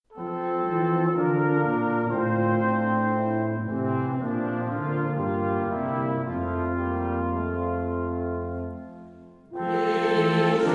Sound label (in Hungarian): Husveti Jingle; Husveti Szignalok